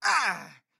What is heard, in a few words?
town human road hit; Blind Drive